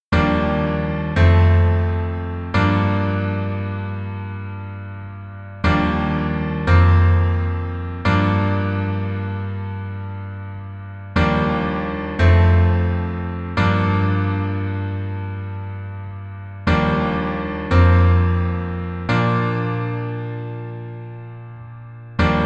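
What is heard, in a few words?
Piano version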